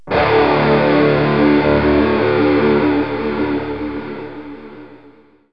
Rock Stab; Music

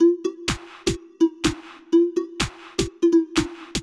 PERCLOOP HOUSE 125; eJay

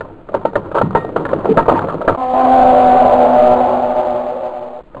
Creak howl; Curse of Dragor

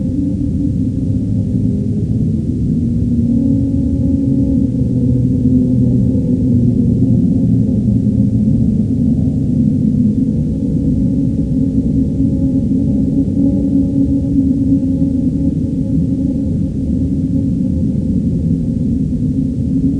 ATMO; TKKG 2

